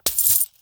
Coin Underlay Play

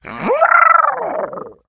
Murloc; Wayward Grimscale

